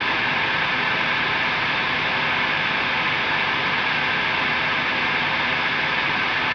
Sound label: left input nothing connected, spluttery noise on audio interface inputs